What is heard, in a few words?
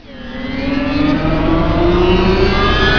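crystal up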